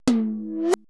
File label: PERC MIX